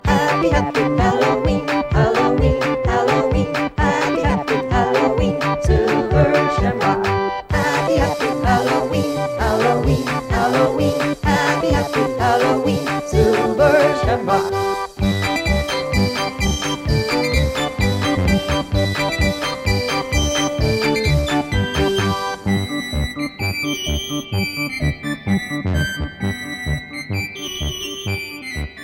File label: Halloween Song